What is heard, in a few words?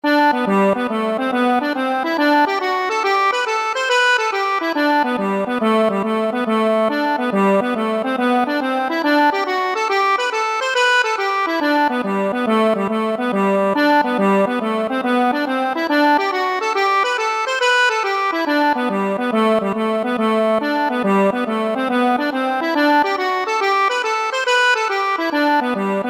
fiddlers frolic, accordion